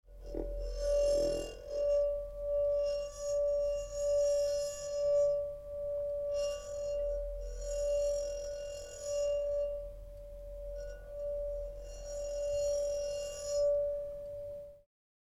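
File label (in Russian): wine glass rings, singing of crystal glass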